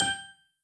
Combo Sound; candy crush jelly saga gamerip

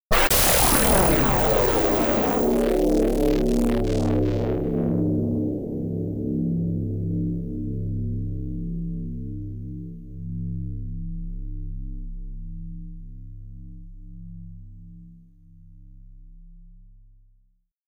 Explosion; Spindizzy II; X68000

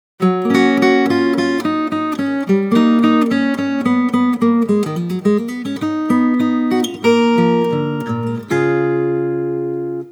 solo guitar

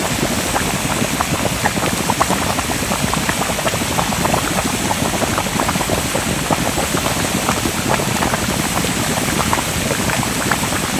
geyser loop, Ice Fields

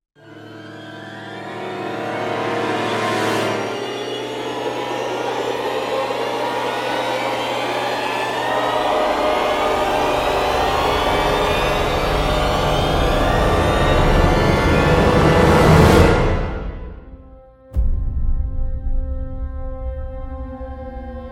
Boss Fight End

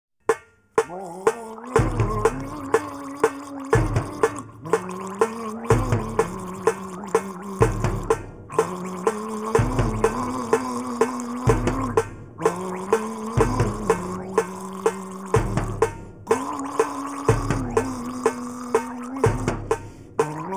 Gargling Drummer; Imaginarium Songs from the Neverhood Skullmonkeys Boombots

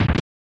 Hit

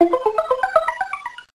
Rain Dance; PRSFX- Rain Dance

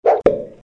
Bat hit Toilet plunger; Rabbids Big Bang; Android iOS Windows gamerip 2013

